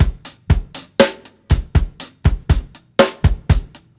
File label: 120 HALF TIME BEAT, DRUMS LOOPS; BASEMENT DRUMS VOL 2